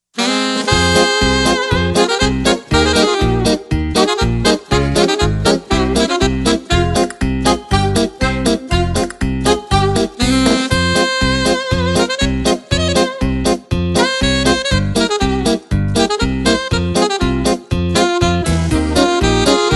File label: Paso doble